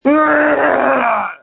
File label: screams